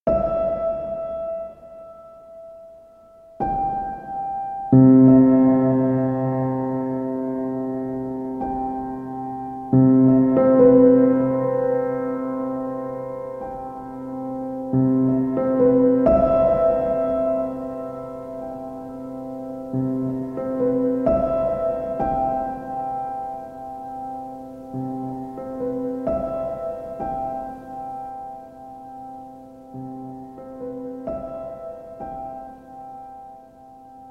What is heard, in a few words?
Piano Sounds